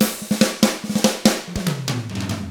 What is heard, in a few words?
rock 6/8, drums; ILIO; Double Platinum Drums 1